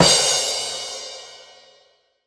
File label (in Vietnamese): Âm thanh công bố thông báo kết quả cuộc thi